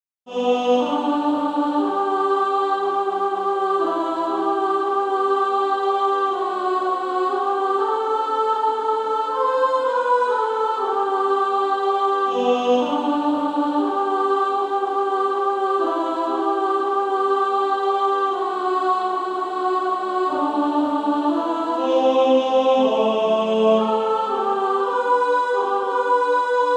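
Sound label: Female Choir, Practise Music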